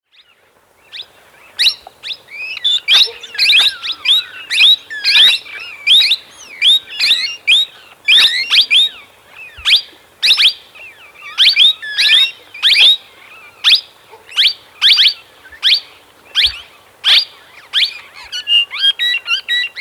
plum headed parakeet

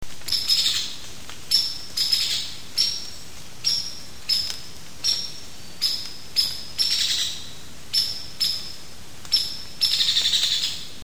hairy woodpecker

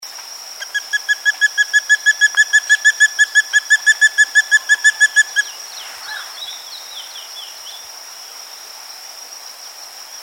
spot breasted woodpecker

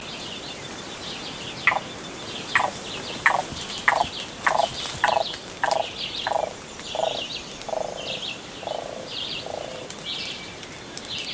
pearly breasted cuckoo

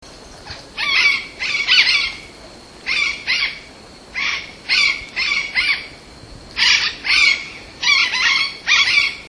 yellow eared parrot

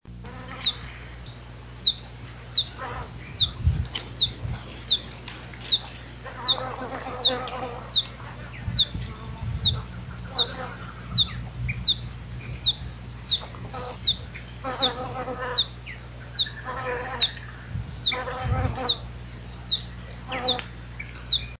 ruby topaz hummingbird

